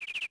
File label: bird; Ambient; MX vs ATV Unleashed